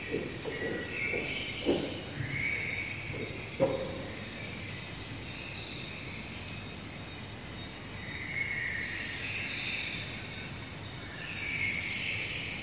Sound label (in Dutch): ochende gefluit; waves